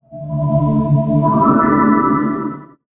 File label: Startup; Windows Never Released Soundpack 1